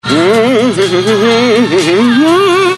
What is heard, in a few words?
hmhmhmm